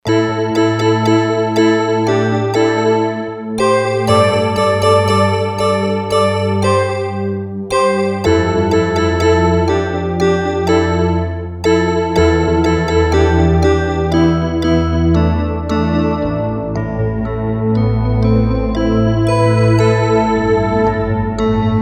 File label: Bell Choir; Digital Synthesizer